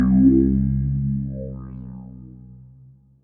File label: shoot; overte; Material Gun